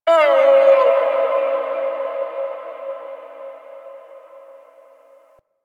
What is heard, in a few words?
Landscape Distant Creatures, Ambience; Zone Two; Warframe; Venus Open World; One Offs